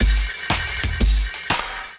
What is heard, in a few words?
Kit; Animals Kit; Ableton; Drive and Glow